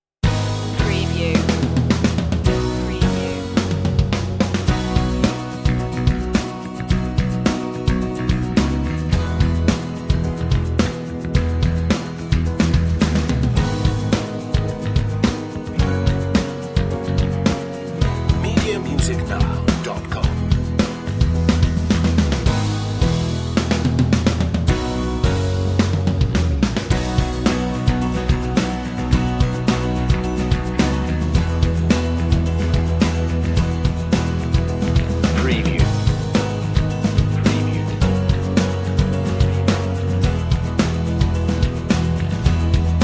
no melody

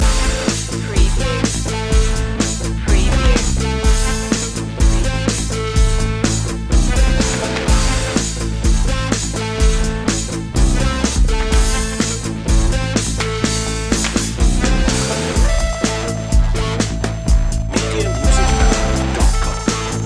retro video game krypton loop

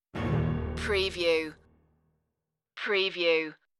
toys marching stinger

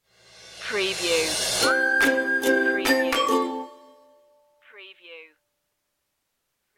jolly ukulele stinger

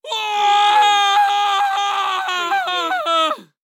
Scream Male Long; sound effects bible

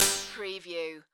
Nose Noise Short Metallic Hit

